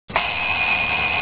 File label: alarm